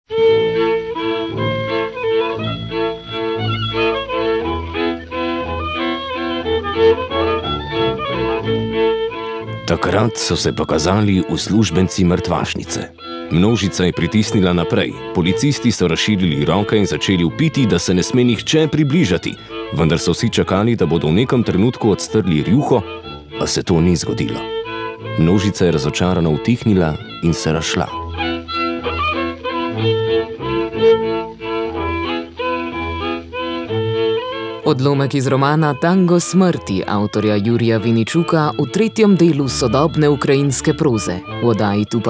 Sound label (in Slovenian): jingle